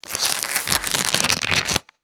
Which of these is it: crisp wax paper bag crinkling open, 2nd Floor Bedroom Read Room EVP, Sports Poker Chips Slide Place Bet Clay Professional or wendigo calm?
crisp wax paper bag crinkling open